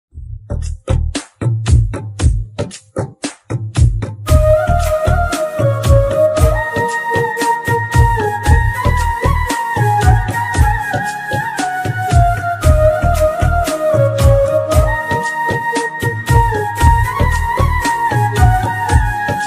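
background music ringtone flute instrumental love background music; Adavari Matalaku Ardhale Verule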